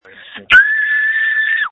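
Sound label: Scream, phone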